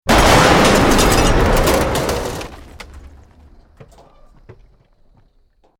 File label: Building Collapse Medium